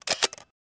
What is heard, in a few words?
Antique Camera Shut, shutters; shutters & movement